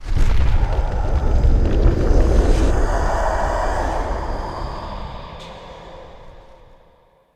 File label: Fight Start Stinger; Enemy; Characters; Abilities; Mendicant; Mnd King